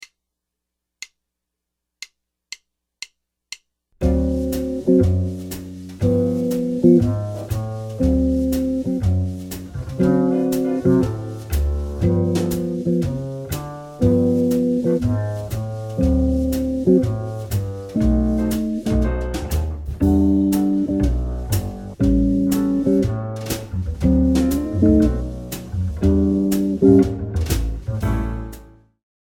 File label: Tritone, guitar